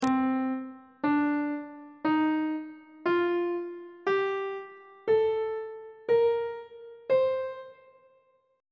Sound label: c dorian mode on treble clef